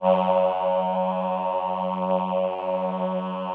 VP-330, String Machines

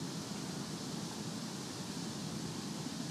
Ambi Loop, outdoor samples Ambience Loops

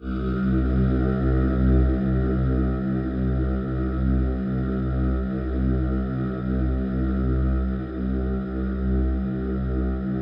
C2, Synth Leads

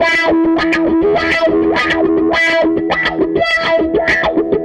Gretsch Guitar Loop

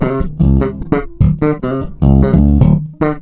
130 LOOP BASS; Big Beat Samples & More; AKAI; LOOPBASS KIT

